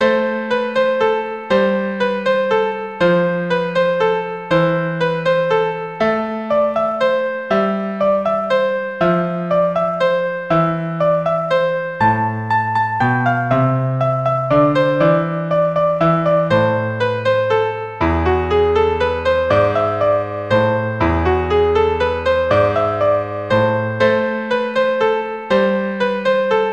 A minor - 120bpm; Carol of the Bells

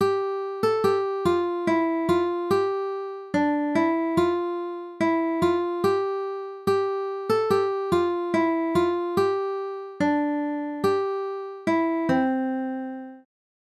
ukulele; London Bridge

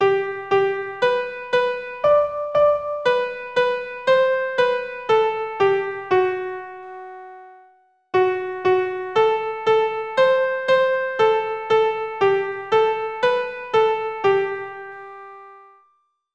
slower

